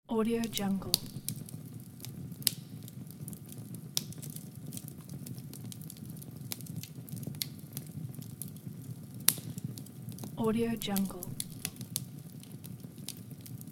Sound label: campfire sound